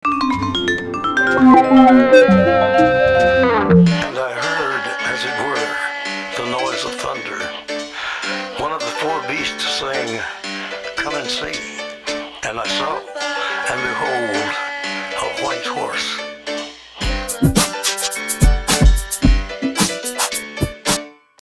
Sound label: Lullabye